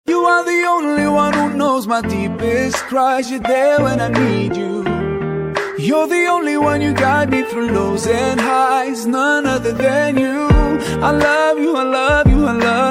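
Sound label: English islamic ringtone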